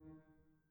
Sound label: harm D#3, Grand Piano; bitKlavier Grand; Piano Bar